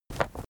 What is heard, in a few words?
kiss bright; zaps buzzes